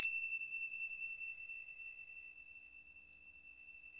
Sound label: CYM, Orch Kit; Roland LCDP03 Orchestral Perc; Orch Combo 1